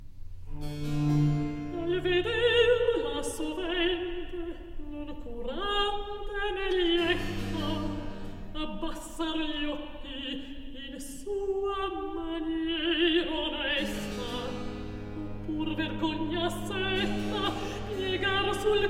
Cantata Recitativo, vocal

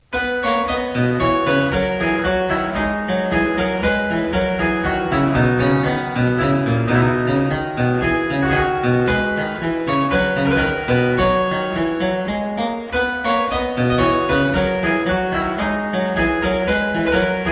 Suite no 11 in C Major - Menuet, spoken; Johann Mattheson; The Twelve Suites of 1714

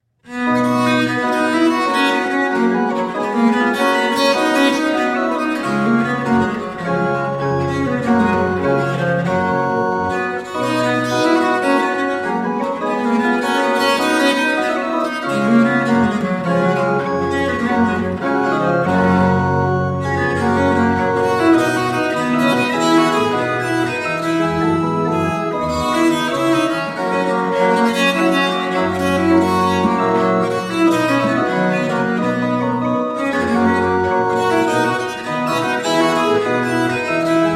Gigue Sonata in C Major, Viola da Gamba; Johann Daniel Hardt; German Viola da Gamba Sonatas

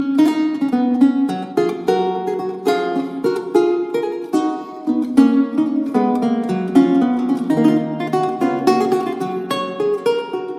Gigue; Ludovico Ronacalli

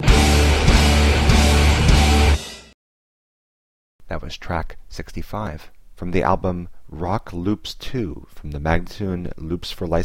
087 D spoken, Rock Loops; spinecar; Loops For Licensing; MagnaLoops

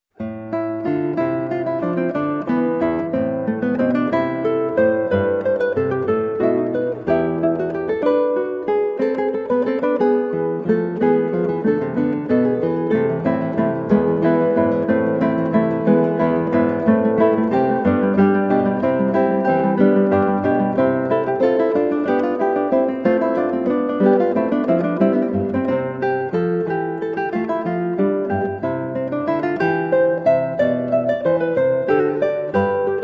Allegro, Guitar; Trio in G Minor (trans A Minor); George Frideric Handel

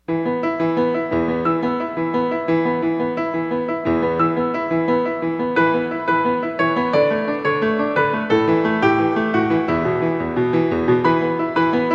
Prelude in E Minor, Solo Piano

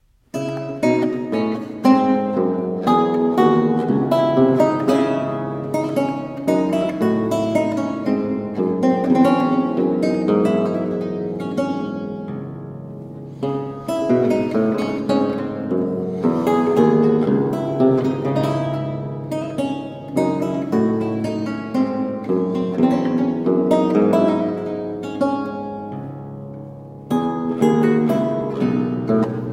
Menuette in D Minor, Lute; De La Croix; Anton Logy

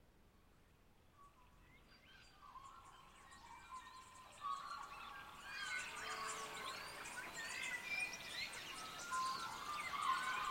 Bird Song

